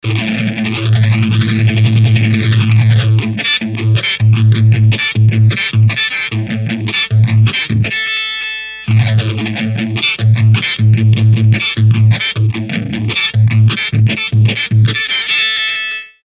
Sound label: Strum Trigger